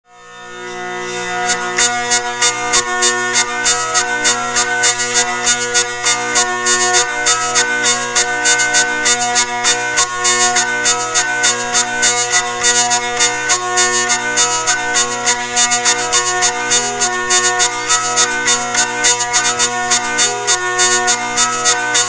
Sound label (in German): oktav ohne, vielle; oldstyle